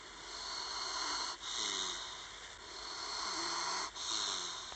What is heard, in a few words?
Dog snore